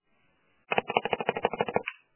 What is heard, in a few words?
good call; webrtc